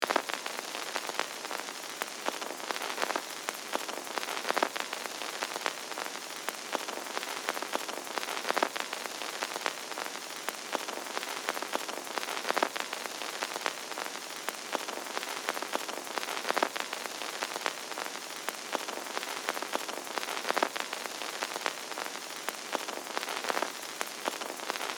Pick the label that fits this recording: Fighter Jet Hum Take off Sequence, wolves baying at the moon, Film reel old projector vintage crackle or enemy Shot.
Film reel old projector vintage crackle